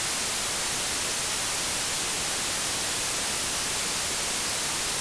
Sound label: white noise; OneShot Fading Memory